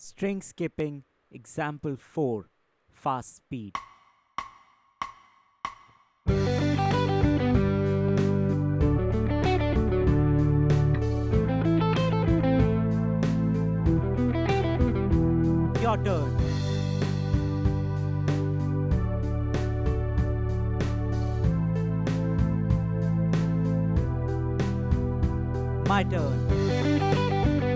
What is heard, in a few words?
String Skipping Playthrough Fast Speed, lead guitar; string skipping arpeggios; The Art of Lead Guitar